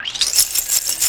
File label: tape fx, scratch; Ambient Grooves